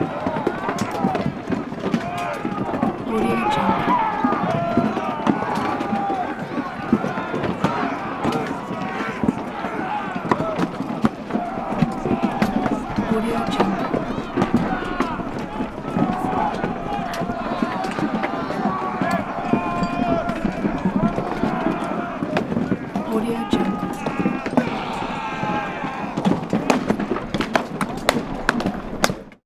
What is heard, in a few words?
military vikings battle vikings med distant; game; میدان نبرد وایکینگ ها